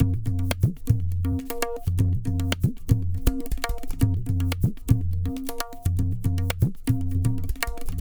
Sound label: tabla, 120 bpm; Zero-G; Pure Tabla